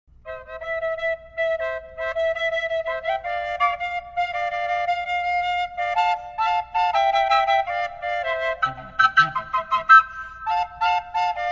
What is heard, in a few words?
Double Flute C; Doppelflöte